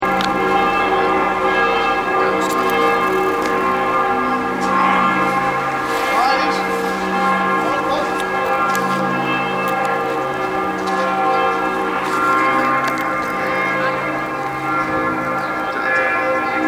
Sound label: grossmuenster